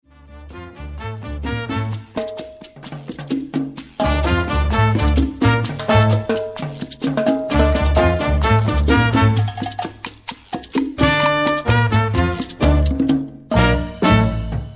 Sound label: Latin Jazz; Putumayo World Music